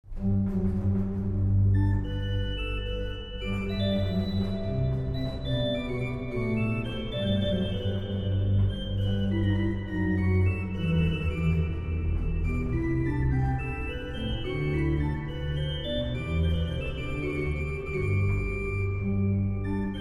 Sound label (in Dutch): orgel